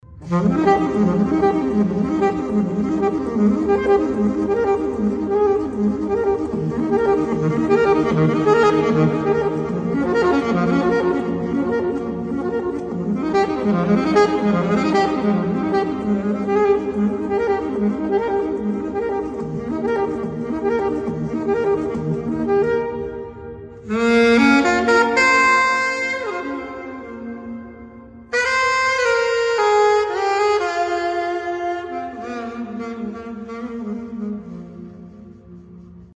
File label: echo sax end